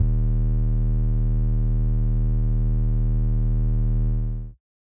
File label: 808's